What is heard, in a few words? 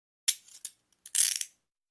handcuffs